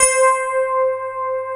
FM ELP C4 L, Keyboards Old School; USB Soundscan vol.09 - Keyboards Old School; AKAI

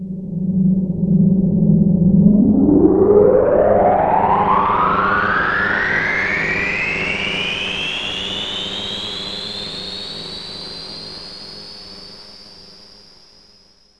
takeoff high, spacecraft; Zero-G Ambient 1

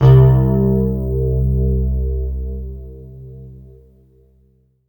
C2, Synth Line; 90 s Sample CDs; NiceBeats; SynthLine VOL-23

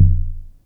SYNCUSSION

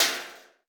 Trash Lid; Drum Machines; Alesis D4; Efx

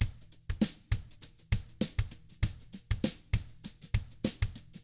FUNKY, Drums

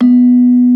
SLENTEM, Bronze Gamelan; E-MU Formula 4000 Series Vol. 4; Earth Tones

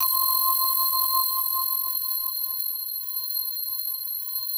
PAD, DIGI-PADS; Best Service; XX Large Pads; Sample CDs

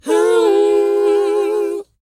WHOA D#, Vocal R&B & Dance; ILIO; Vocal Planet

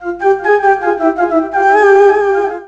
SYNTH, ARABIC

